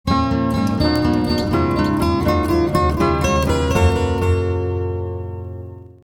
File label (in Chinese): 电视包装音乐